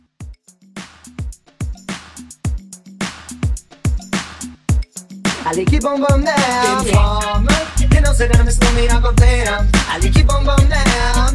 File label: 90s Hiphop Old School